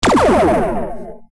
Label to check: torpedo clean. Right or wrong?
Right